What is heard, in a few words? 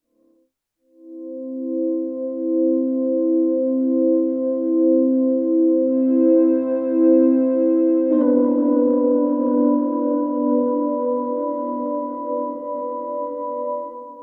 Ebow Gtr FX no reverb